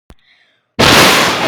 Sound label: explode